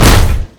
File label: car hit; tf2; pyrocar